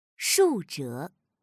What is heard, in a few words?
stroke